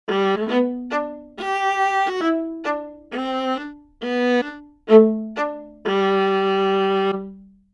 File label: viola